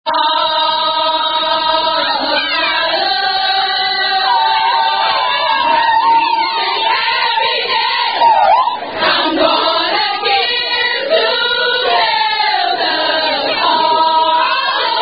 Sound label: Delta Sigma Theta Singing, Sorority Chant; Aka My Heart And My Soul; Sorority Chant Song